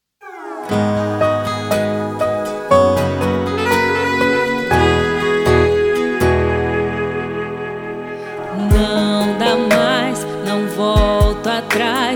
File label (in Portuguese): sofrencia